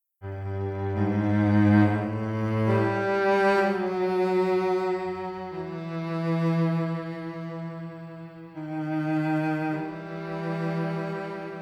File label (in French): Violoncelle trio